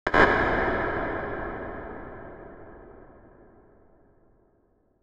cathedral; understanding reverb; anderton

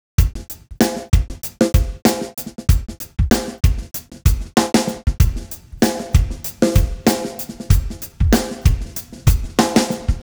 drums 110; timestretch

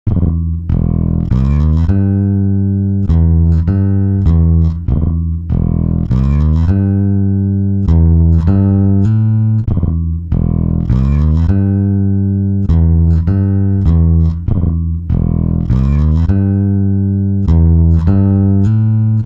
bass; celemony melodyne; timestretch

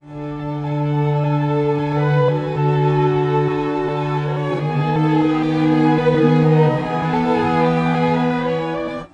strings, pitchshift; celemony melodyne

